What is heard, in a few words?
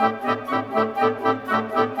Winds, Orchestral; Sonic Foundry (Sony Creative Software); Orchestral VOL-2; Modern 20